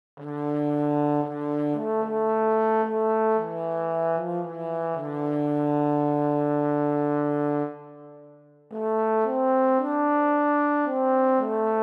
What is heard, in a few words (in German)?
solo Posaune; Scarborough Fair